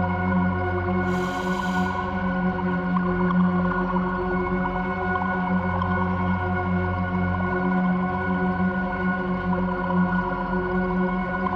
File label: psi ship science ship idle; Stellaris; shroud